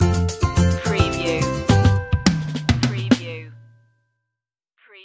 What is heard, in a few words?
easy life stinger